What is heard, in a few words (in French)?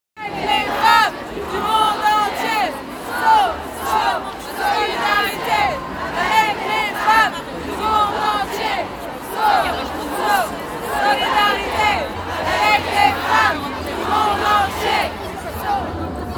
Chant solidarité